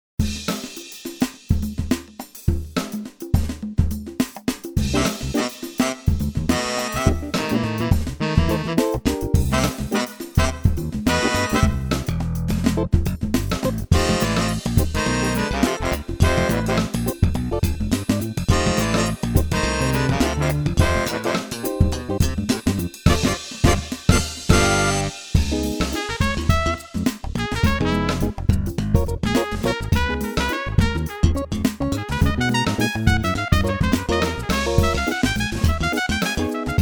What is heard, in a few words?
funk horn